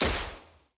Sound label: Gun Shot; SoundsCrate